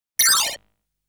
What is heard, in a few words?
Machine gun